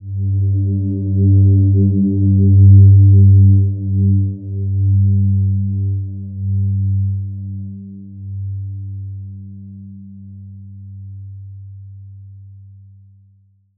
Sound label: Silver Gem G2 mf; healing soundscapes